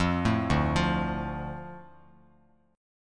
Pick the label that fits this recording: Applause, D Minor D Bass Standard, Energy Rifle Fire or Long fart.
D Minor D Bass Standard